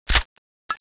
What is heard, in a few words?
clip in, weapons; bloodhunter; cstrike